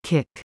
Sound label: KICK